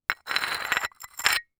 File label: Foley Stone Scrape Multiple Short